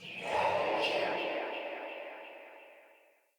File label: Transmission In; Fenn Jotar; Transmission In Outs